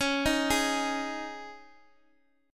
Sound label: Db Suspended 2nd Guitar Open G